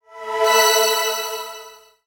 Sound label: divine